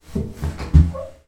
household body movement in metal bathtub with squeak